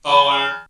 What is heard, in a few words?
SFVX